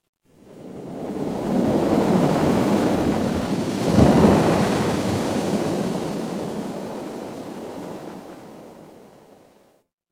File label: ambience ocean